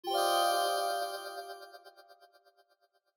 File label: Clear Em7 High